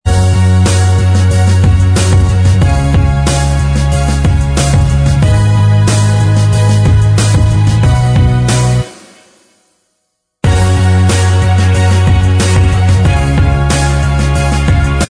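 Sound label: Instrumental Track, backing tracks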